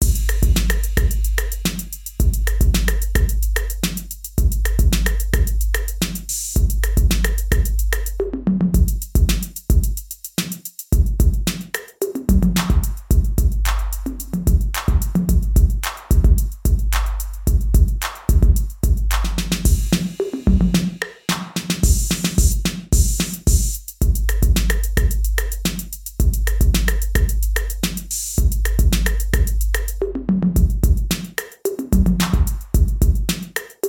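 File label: tape 808, bass thickener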